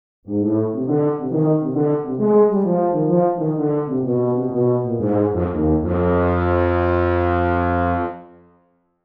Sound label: Solo Tuba